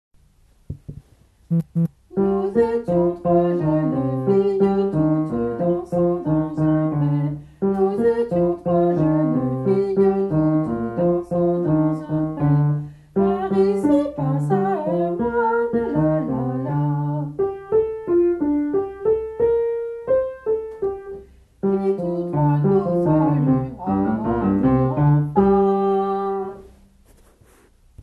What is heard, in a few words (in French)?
Jeune fille basse